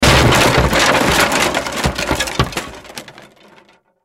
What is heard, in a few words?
Crash Wood Objects Debris; Wood (Sound Effects:Materials); Blastwave